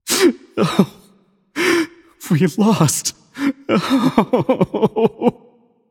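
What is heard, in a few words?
nonverbal boohoo; voicepack; smite; merlin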